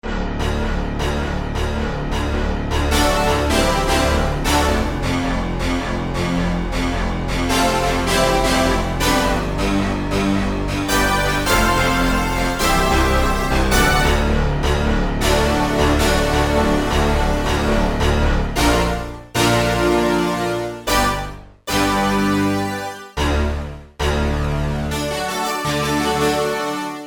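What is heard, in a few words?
Fast Attack Fuzz; Digital Synthesizer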